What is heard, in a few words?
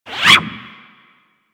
Bullet By; Grineer Arch Skiff